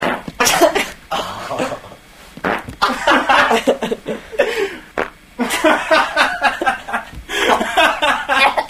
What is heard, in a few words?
farts